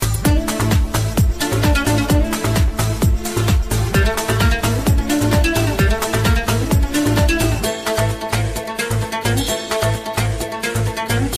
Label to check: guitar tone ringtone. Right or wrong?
Right